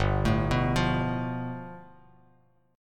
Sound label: G 9th, Bass Standard; G9 arpeggiated